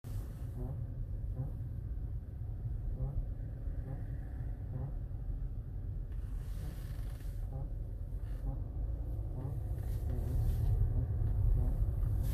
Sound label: rubbing sound underneath car; mazda cx-3